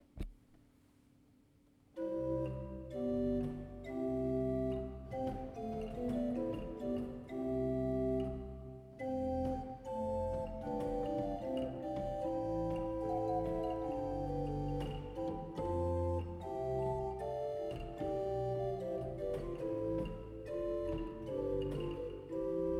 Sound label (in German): Walcker 6 I P, orgel